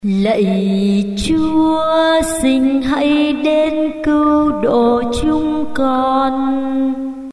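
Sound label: soprano alto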